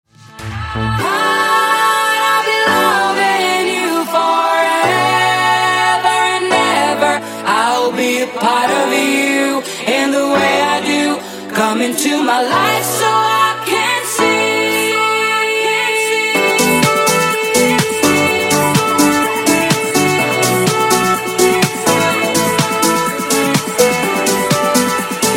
Funk Clean 125 Bpm

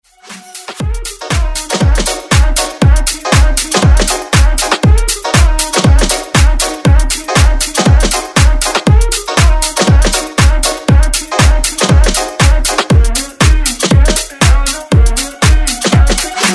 Dance

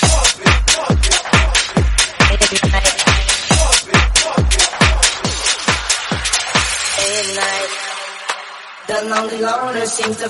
138bpm

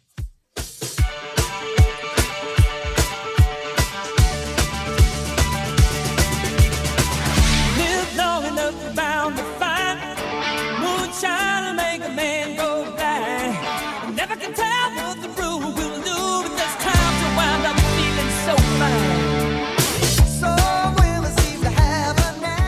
80s Redrum 150 bpm

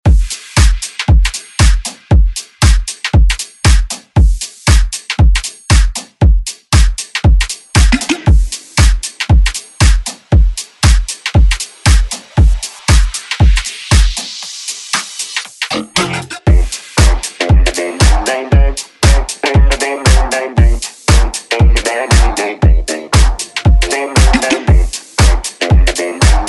Dance Redrum

